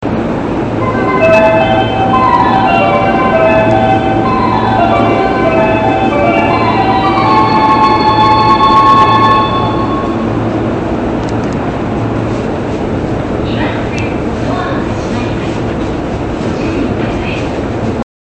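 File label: ushiku, departure bell; zyoban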